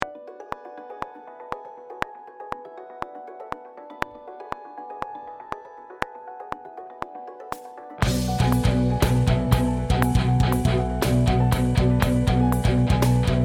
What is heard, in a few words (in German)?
Neckbreak Beispiel